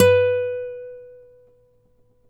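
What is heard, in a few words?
nylon, FLAMENCO, Guitars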